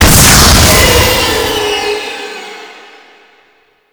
Liset Cycle Engines Rand C; Tutorial

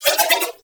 Nunchaku Prime Spin Whoosh; Weapons; Warframe; Tenno

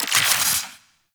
Infuse Poison UI; Warframe; Warframes; Alchemist

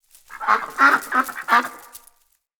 Duck Idle Chatter; Encounters; Animal Rescue

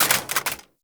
AK47 Reload Mag Drop; Warframe; Tenno

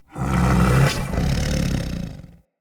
Corrupted Battle Dog Snore, Animals, Vocals

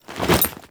Generic Foley; Warframe; Temple

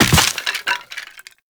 Operator Land Soft Bone, Footsteps; Characters

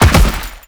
Land Heavy Dirt; Enemies; Footsteps; Warframe; Teshin

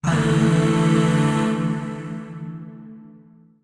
Shawzin Pent Maj Chord E; Warframe; Zariman; Void Shawzin; Chords

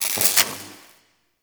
Elec Spark; Warframe; Nokko Colony; Ambience

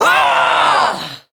Throw, Barks; Kuva Lich; Persona C; Ability

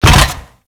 Grineer Land Metal, Footsteps; Warframe

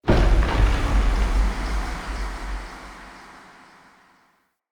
Crypt Wind Trap Shut Down; Champion

